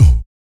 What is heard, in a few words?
VOC PERC, Vocal Planet Jazz FX BASS PERC; Spectrasonics Vocal Planet